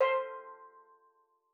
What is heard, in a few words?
C4, STEELDRUMS; Xsample VOL-4